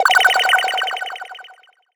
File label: Sci-Fi Lasers - FX Sweeps; Antidote Advent